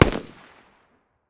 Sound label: close distance, 7.62; tacz-tweak-pack; taczmechanics